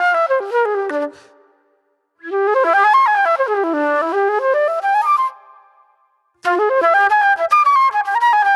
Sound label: Raw Funk Flute